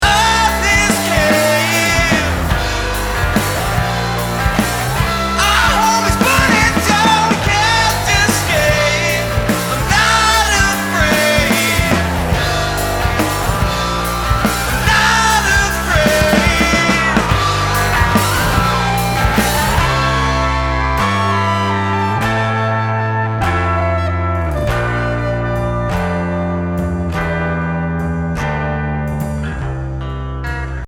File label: guitar feedback in context